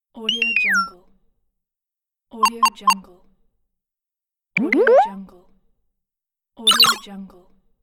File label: indie playful for gaming